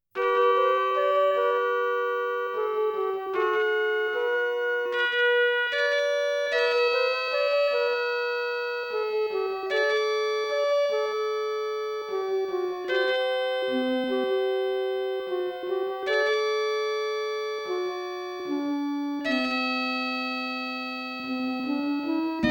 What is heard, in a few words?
Field motif; Estpolis Denki 2; Lufia 2 Rise of the Sinistrals